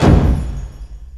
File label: BANG, explosions gunshots